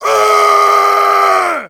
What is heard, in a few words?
Male Rage Scream